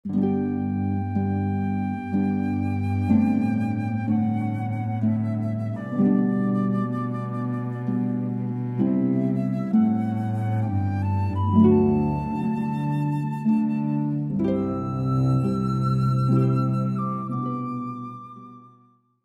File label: Largo, Xerxes, Handel, harp flute cello